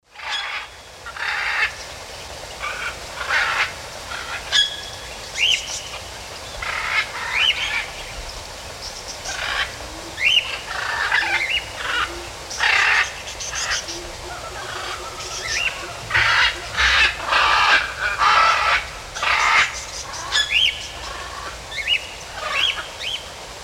penie ptits i shum dozhdya; v lesu

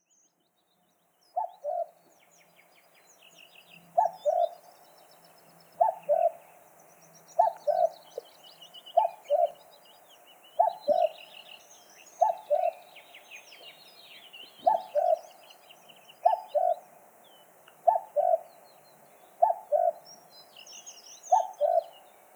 kukushka cuculus canorus, zvuki kukushki

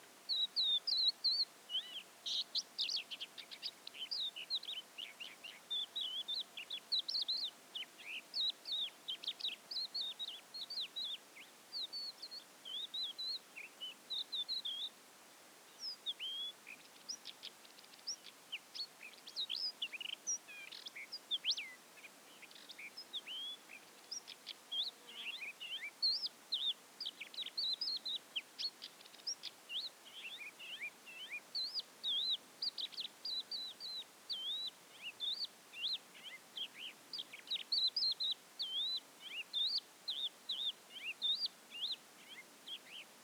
hohlatyy zhavoronok galerida cristata, zvuki peniya ptits

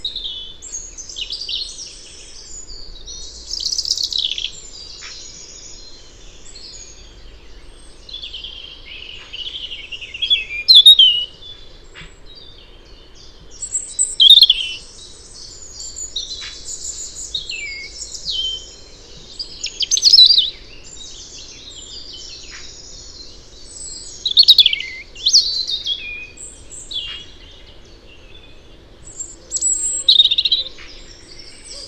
zaryanka erithacus rubecula, zvuki peniya ptits

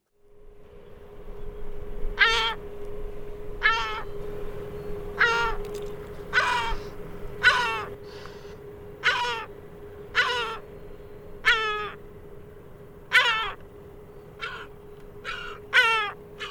dominikanskaya chayka larus dominicanus

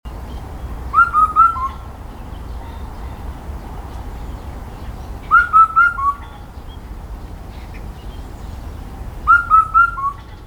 indiyskaya kukushka cuculus micropterus, zvuki kukushki

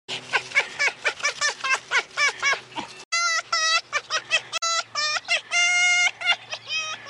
smeh lisy

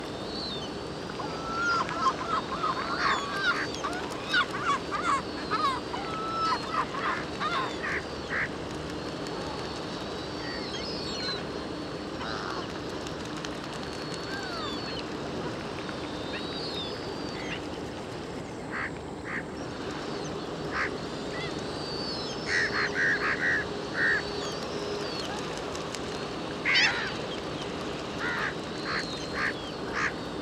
klusha larus fuscus heuglini, zvuki chaek

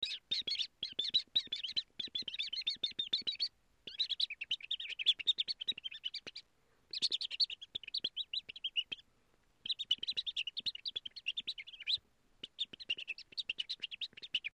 imitirovanie krika tsyplenka; zvuki kak kudahchet kuritsa

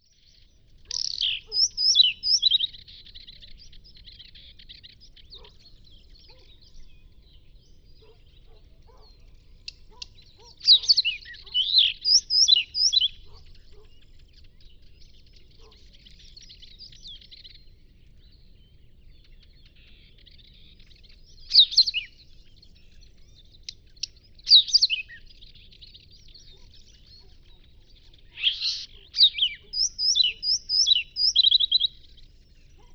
varakushka luscinia svecica azuricollis, zvuki peniya ptits

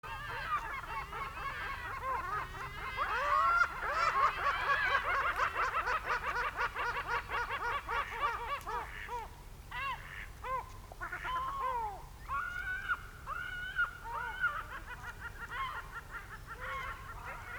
hohotunya larus cachinnans, zvuki chaek

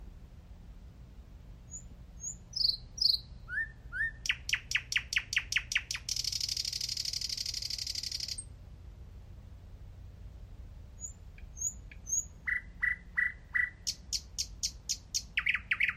solovey luscinia luscinia; peniya ptits

